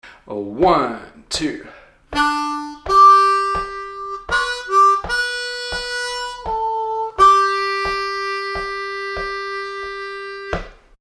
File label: Amazing Grace Lower Octave Lick slow, harmonica